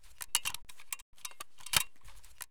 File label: akms stock unfold; Escape from Tarkov